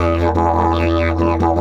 E 150, DIDGE RIFFS; Big Fish Audio - Didgeridoo and Other Primitive Instruments

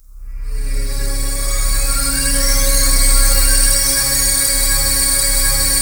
GANI-STRINGS; Best Service Dream Experience; GANIMED III

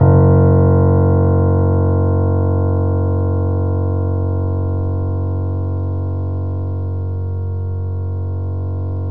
Yamaha C7, 3D Pianos; E-MU Producer Series Vol. 5

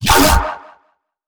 BASS SHOT YOINK

